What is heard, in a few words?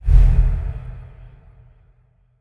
shadow teleport